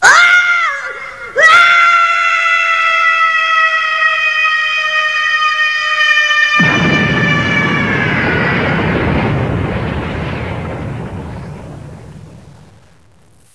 aaaaaaaaaaaa

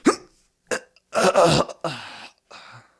death; police

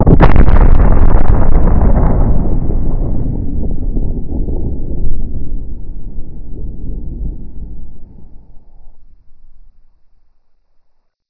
mortar hit

